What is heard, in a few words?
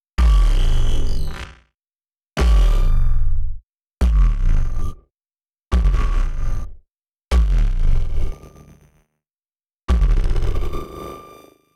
Impact Motor High